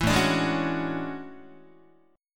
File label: A Major 13th Guitar; AM13; strummed; JGuitar